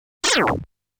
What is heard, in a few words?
Enemy Explosion Sound; 1500 Series Collectors Box; Toaplan